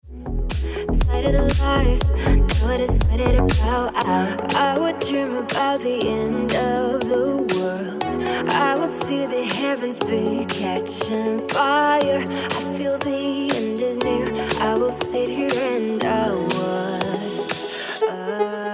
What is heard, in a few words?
Ringtone